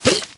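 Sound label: spear goblin step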